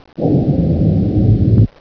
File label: disaster; ambience